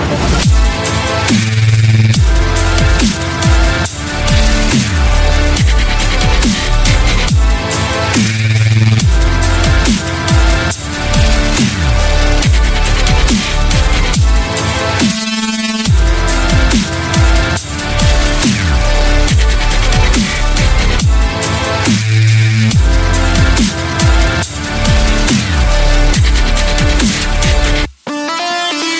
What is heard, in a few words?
Dubstep; Overwatch